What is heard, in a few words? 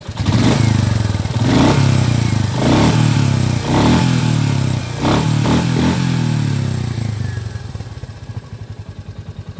RE Meteor 350